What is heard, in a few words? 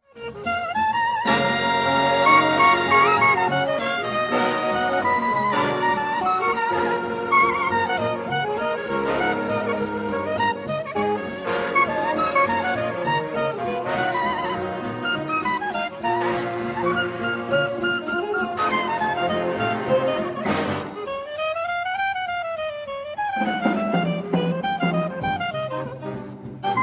clarinet